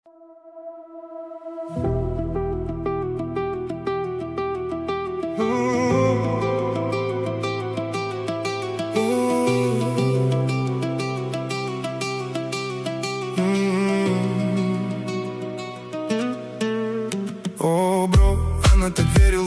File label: deep house remix